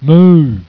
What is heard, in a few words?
moo